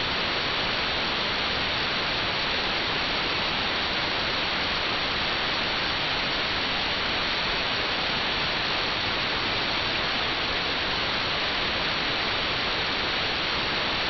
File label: White noise